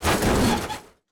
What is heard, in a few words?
COLLISION CRASH LITE; SIMUZENSOUND; Collision Lite Bass